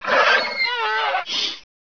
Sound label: raptor big flinch